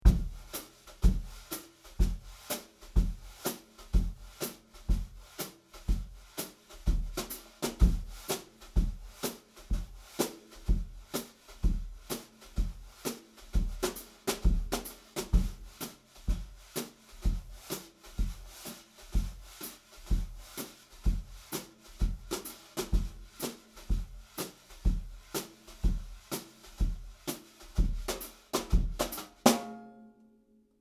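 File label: Brushes EAD only, snare drum; Yamaha EAD10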